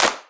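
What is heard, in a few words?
clap, Boss DR-202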